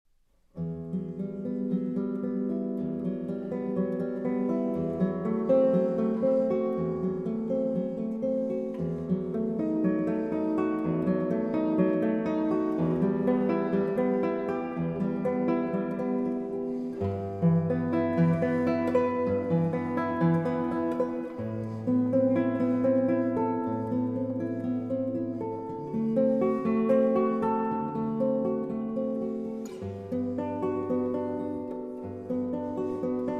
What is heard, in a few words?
Version for Guitar I. Prélude; Suite antique